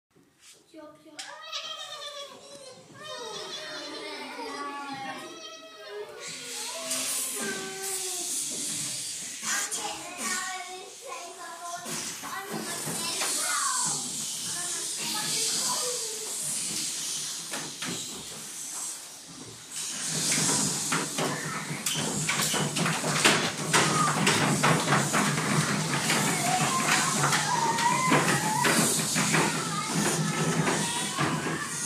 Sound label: rainstorm